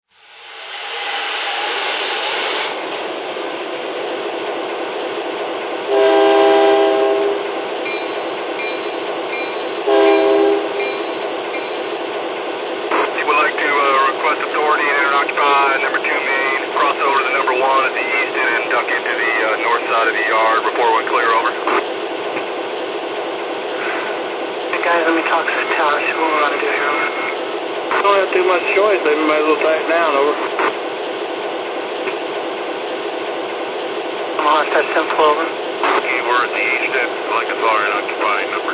sd9043mac, trains

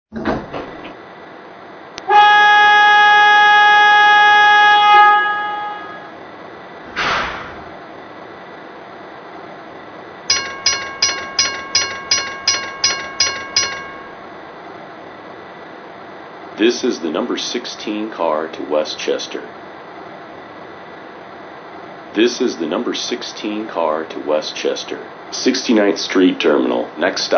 PCC CAR

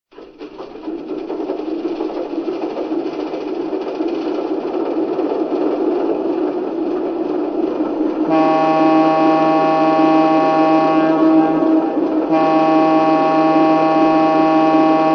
AS-616

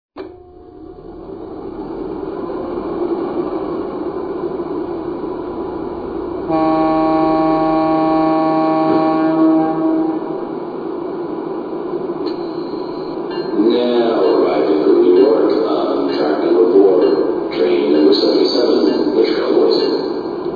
GG1

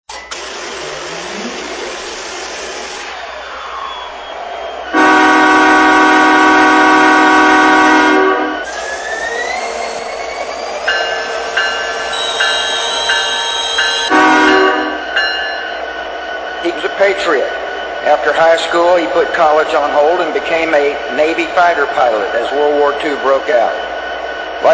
SD70ACe

